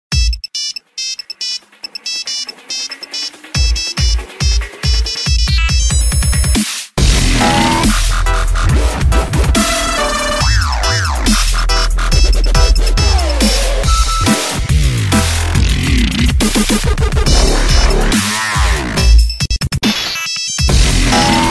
kick nokia; toques para celular

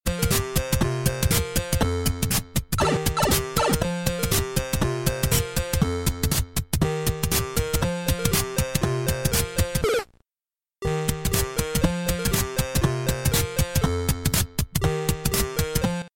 apple opening 8bit

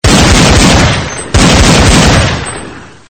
Gunshot, som de disparo de arma

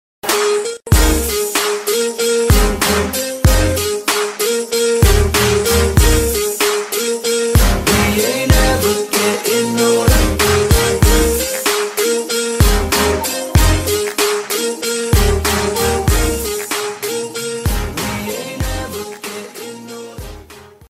dj music dance tone

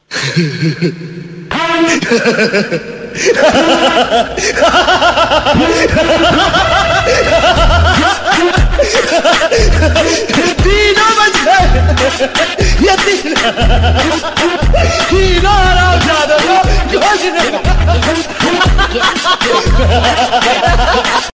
terror laugh ringtone

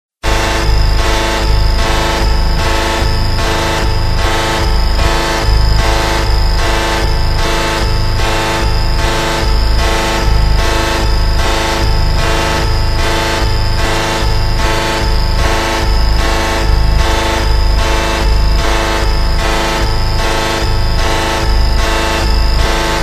warning tone, som de alarme para celular

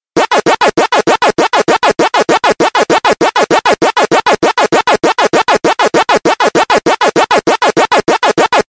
siren alert; pac-man

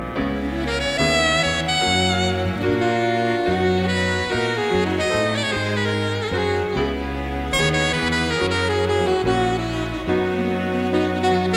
crazy sax

